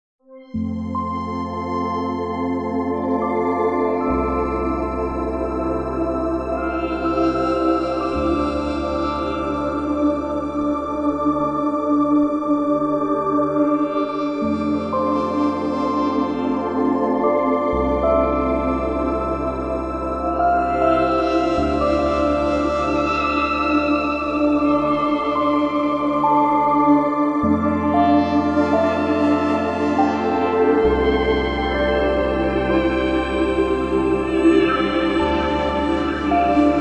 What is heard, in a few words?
all sounds from polychr